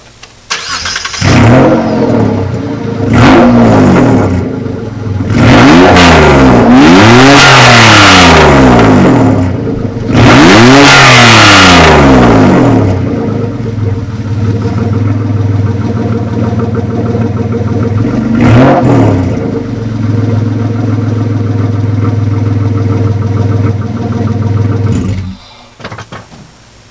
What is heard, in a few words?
stealth exhaust no cat no exhaust; garage; mods